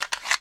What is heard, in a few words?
AKM Mag Out